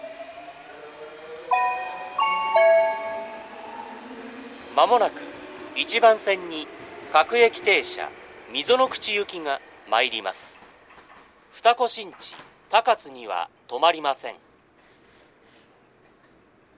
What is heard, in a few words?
midorigaoka local mizonokuchi green, tokyu station